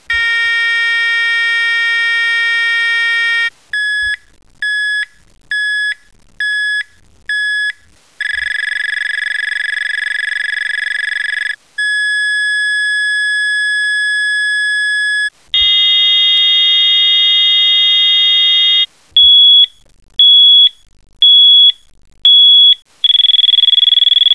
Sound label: stklight 8 tone alarm; ABB Control